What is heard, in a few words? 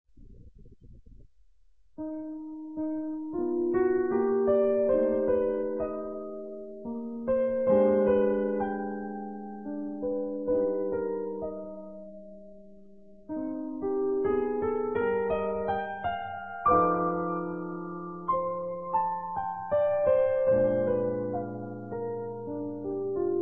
piano; Scriabin; Feuillet d'album; Tjako van Schie